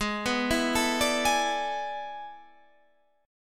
A Major 7th Suspended 2nd Suspended 4th G sharp, guitar chordshape